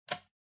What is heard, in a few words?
Wall Outlet Rubber Plug Unlock